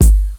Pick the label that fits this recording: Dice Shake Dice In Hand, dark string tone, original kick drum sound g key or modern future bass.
original kick drum sound g key